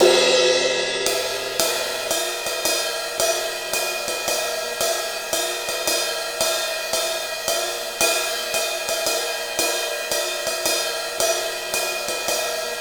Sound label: Ride Cymbal Pattern